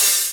Open Hihats, Cymbals

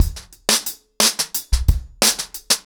ACOUSTIC DRUMS, 90 BPM; The Roots Drumloops; Locked Out

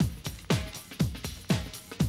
120, Classic Drum Loops

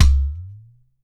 utar low

